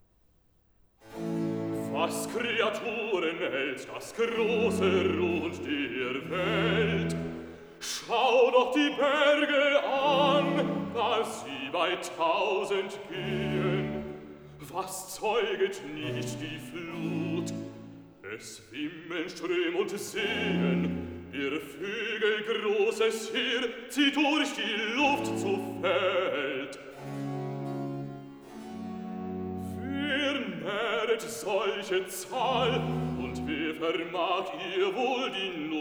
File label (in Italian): Cantata, Recitativo; BWV 187 'Es wartet alles auf dich'; 'Was kreaturen hält'; Bach; Haddington; 7th Sun After Trinity